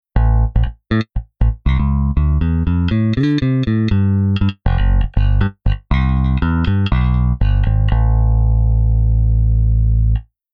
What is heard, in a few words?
bass active